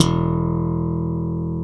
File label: FUNK BS E2, SLAP BASS